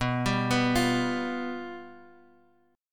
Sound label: G 7th B